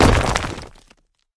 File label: shield break; Smite; Cabrakan